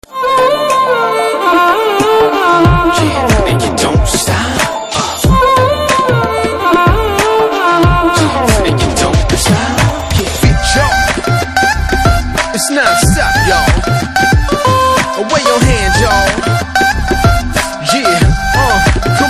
BGM Telugu Ringtone

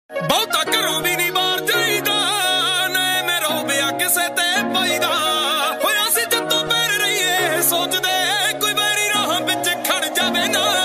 Dance Punjabi Ringtone

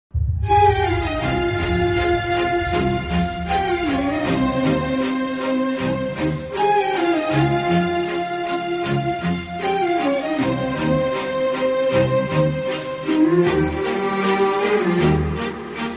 Love BGM Telugu Ringtone; Raja Rani

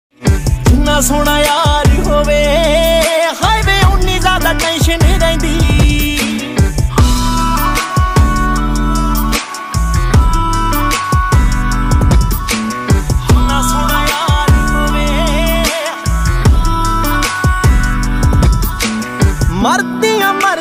Punjabi Beats Ringtone

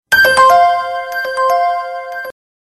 Iphone Notification

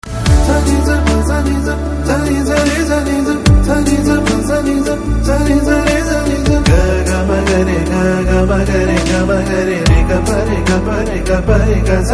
BG Tone Telugu Ringtone

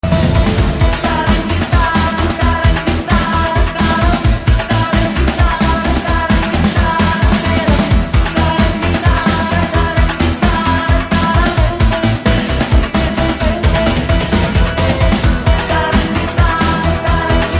BGM Telugu Ringtone

